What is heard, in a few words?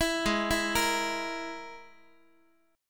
Ab Suspended 2nd Sharp 5th Ab down Guitar; arpeggiated; JGuitar